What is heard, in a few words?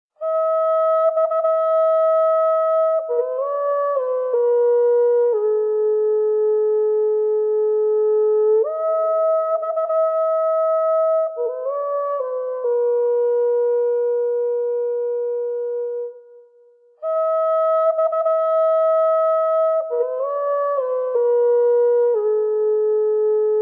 Norwegian viking music